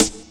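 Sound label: snare drum, Drum & Bass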